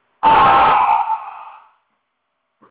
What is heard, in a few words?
Roar